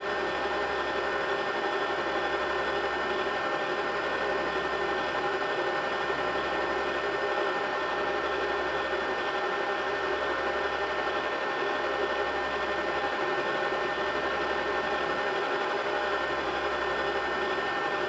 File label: submarines engines; World Of Warships